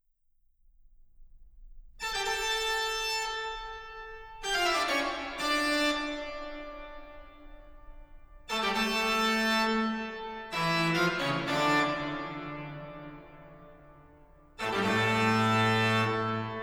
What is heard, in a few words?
bach toccata intro; audacity